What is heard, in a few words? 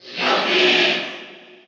Cheer Russian; SSBU